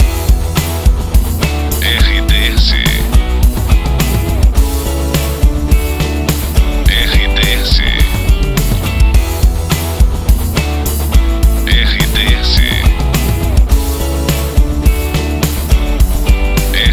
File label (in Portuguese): trilha para locução; Pacote Itapoan FM, Salvador/BA, uma emissora com característica jovem